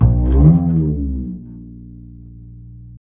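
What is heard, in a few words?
glissando, double bass; Univers Sons; Jazzistic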